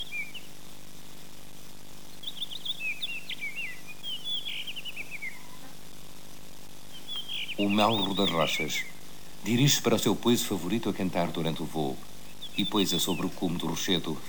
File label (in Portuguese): melro rocha; Ecossistema; montanha